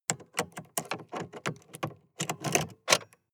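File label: sports car interior key pull out long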